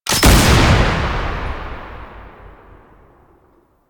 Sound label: Assist Shot; Quincy; Warframe; Quests; Nineteen Ninety Nine